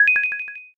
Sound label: quick press